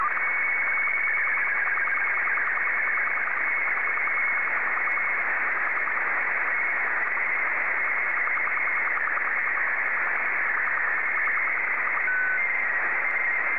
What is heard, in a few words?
sstv; ZQ3AJQ